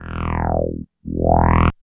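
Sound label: OWWWW, right; East West - Phat & Phunky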